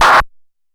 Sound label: Snare Hi, Stylophone Beatbox